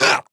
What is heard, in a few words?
Death Grunt; Drogoz Dreadhunter